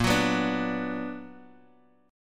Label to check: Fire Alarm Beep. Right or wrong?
Wrong